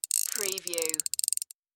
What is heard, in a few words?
Fly Fishing Reel Pull